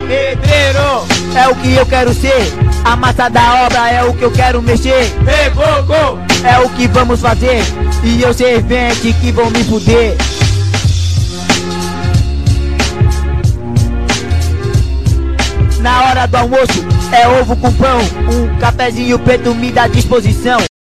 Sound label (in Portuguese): rap